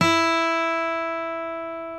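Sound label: reference pitch guitar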